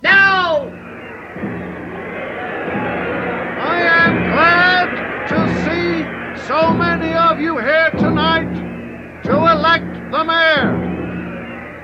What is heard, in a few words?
Crowd effect; The Man Who Collected Sounds